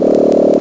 bzzzz; winmixer

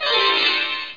lotus open; india

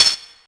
AR GLAS; Dark; thief

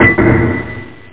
hammer; ForestFight

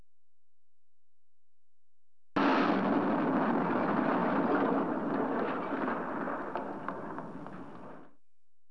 explose

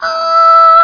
ahh; 7invasores

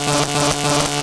powerup pickup

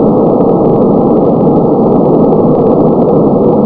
Engine Growl; FALCONMC.DEM

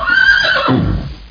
Horses Exclamation; Win95

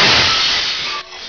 broken; BigMax nº 9

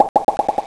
in hole, golf

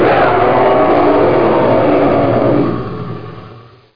howling; 2 channels; 11,025 sample rate

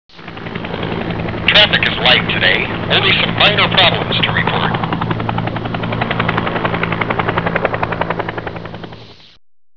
COPTER; T.J. Finds a Friend

